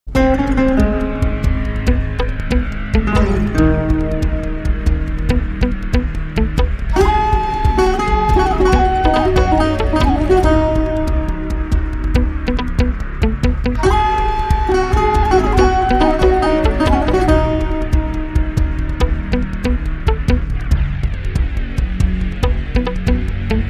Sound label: Suspense